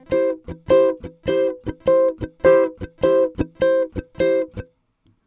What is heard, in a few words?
Blues, uke